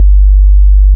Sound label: Bass Shot G